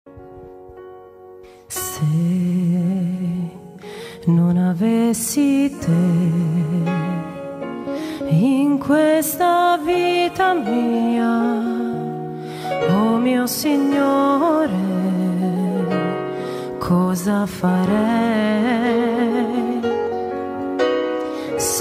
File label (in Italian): Se non Avessi te - Voce - Pianoforte; Canti cristiani; Palermo